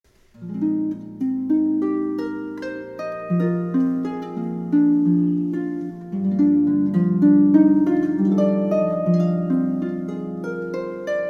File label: violin cello harp trio